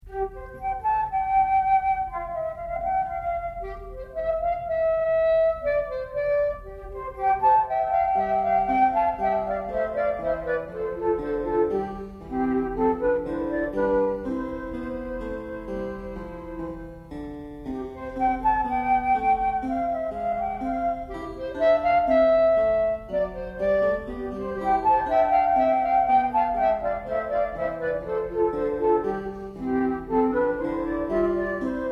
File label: Curtain Music; hobbit